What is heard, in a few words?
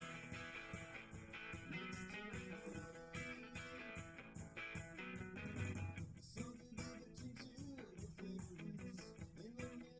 wdrums liverec 5cm; SASSEC07